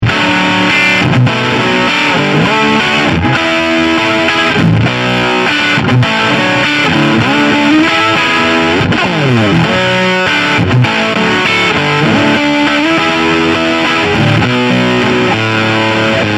Tweak Fuzz

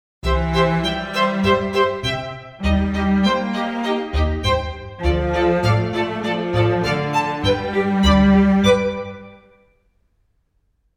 BBC Strings Leader; Spitfire Audio

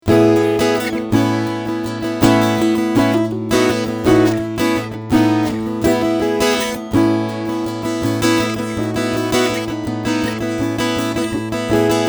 Traveler Guitar Dreadnought Strumming Preamp; Redlands

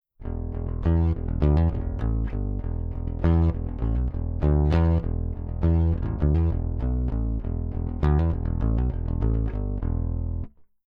Bass Rickenbacker DI Signal EQ All Bypass

